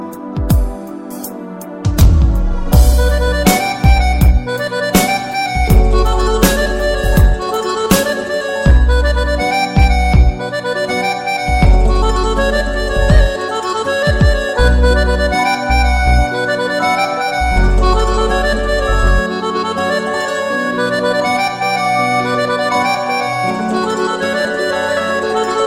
Armenian Melody

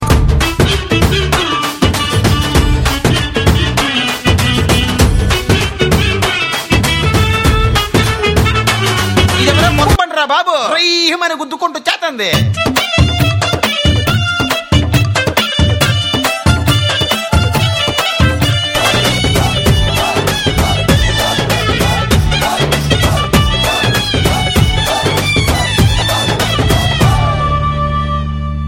love music

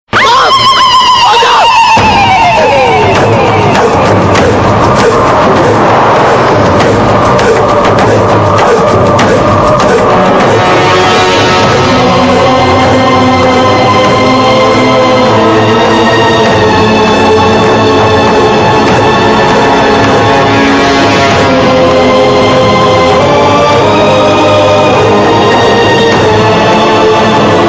Scary

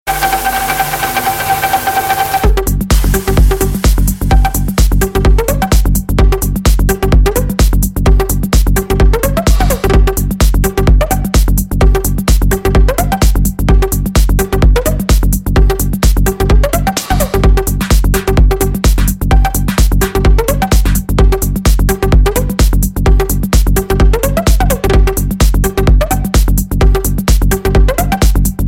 Club

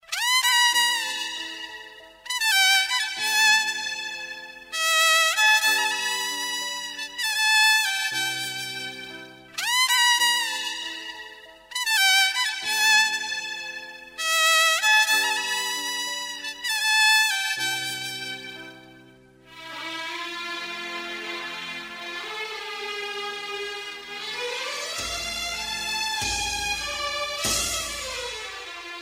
Violin Theme

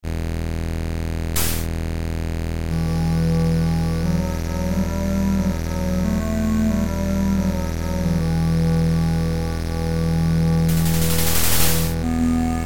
8bit; Halo theme

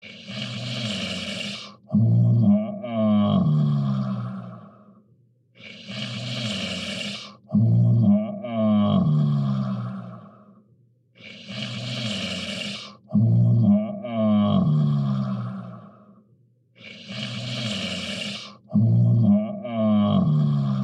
Snoring

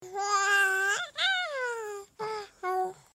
Baby Sounds

